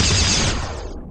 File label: laser shot; BALLY